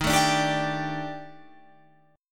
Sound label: Eb Major 7th Flat 5th, Guitar Open F; 15,16,14,14,17,14; strummed